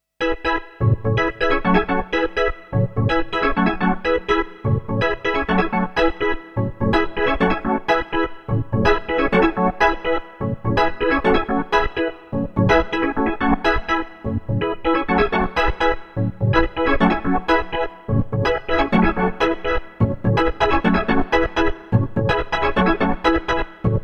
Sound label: LFO 2 CC